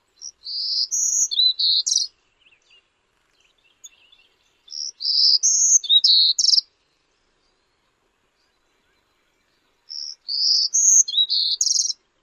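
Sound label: song type, bird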